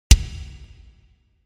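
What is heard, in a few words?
Kick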